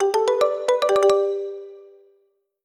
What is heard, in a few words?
Invite; i OS; iOS10